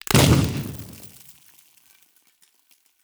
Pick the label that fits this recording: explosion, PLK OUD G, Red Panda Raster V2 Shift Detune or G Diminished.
explosion